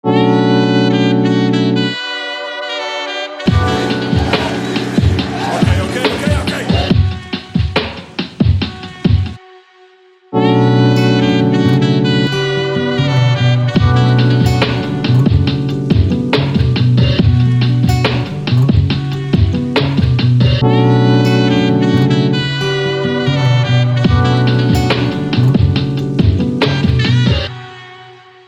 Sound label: serb swing